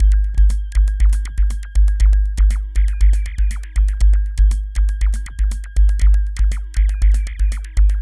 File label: Structured Rhythm, IDM Rhythms